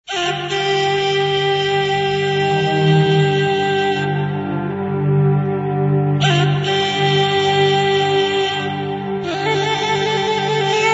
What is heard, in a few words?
ringtone